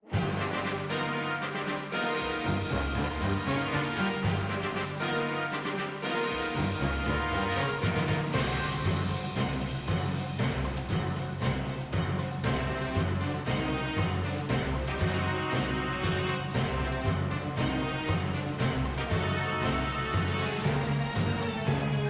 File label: Boss Battle